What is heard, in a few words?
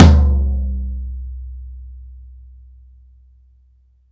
TOM E, Drum Dry; East Collexion; Drum 1